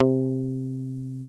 Muted Guitar C

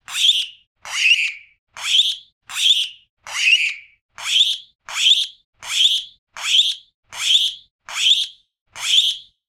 Squeeky Shoes; Bsumusictech

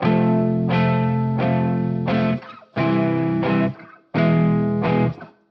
Pop Punk Guitar Loop 87bpm C Major

